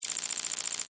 Multimedia Action, Technology, Multimedia, Action, Element; Alert Sound Effects; Alerts (Sound Effects:Multimedia); BlastWave